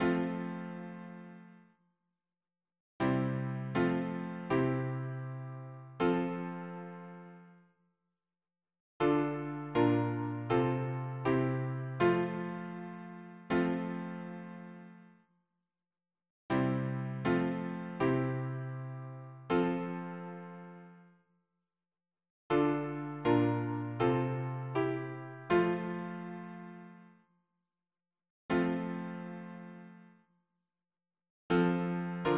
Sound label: harmony piano